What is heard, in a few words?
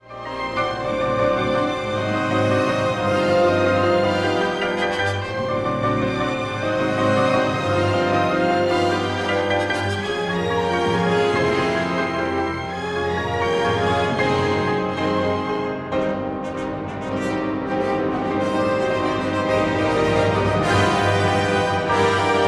piano concerto dramatic return